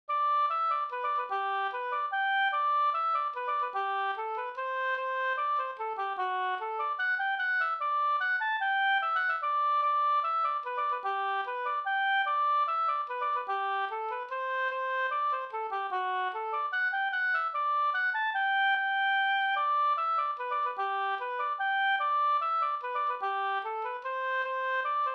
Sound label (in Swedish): hambopolkett; fr Sd e Ulf Lundgren